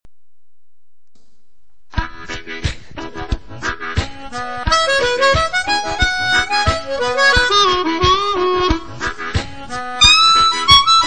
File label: 2nd major, harmonica